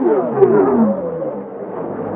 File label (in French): LOOP Frotte Grogne Mono